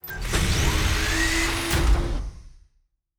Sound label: Door, Sci-Fi Sounds